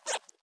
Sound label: sqk hunt; squeek